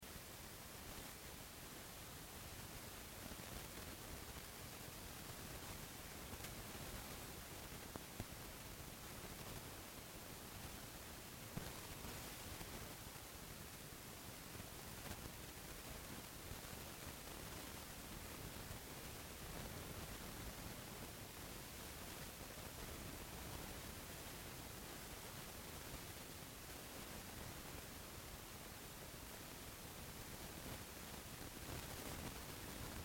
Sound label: noise